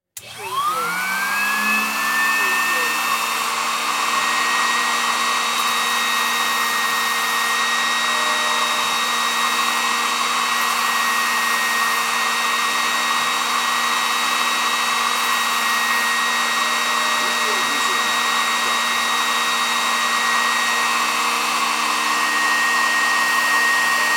Engraving Machine On; sound-effects-bible

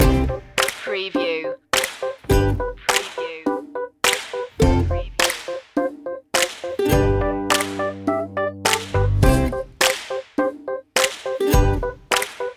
so happy loop

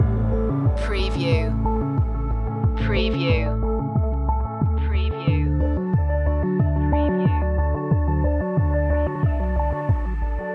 corporate sooth loop

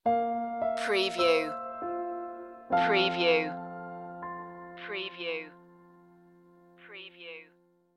soft surrender stinger

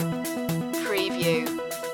news flash loop

